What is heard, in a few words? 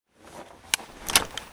Inf Weapons Foley; Red Orchestra 41-45